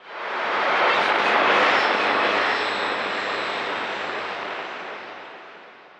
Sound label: AMBNT 160; eJay; Ambient